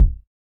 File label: Kick; Samplepack Produtique